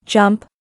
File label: Female Jump Voice